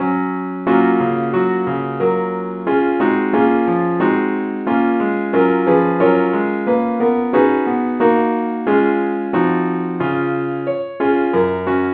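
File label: keyboard only key F sharp; saintthomas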